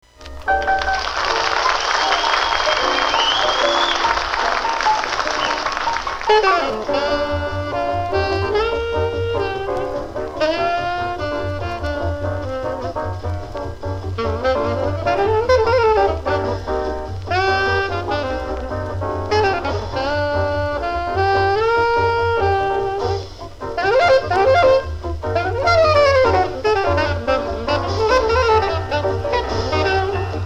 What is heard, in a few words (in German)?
Saxophon; live in LA